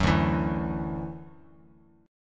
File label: D-Major Add 9th D down Bass Standard